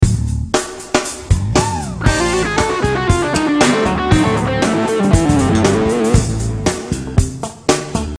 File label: Lick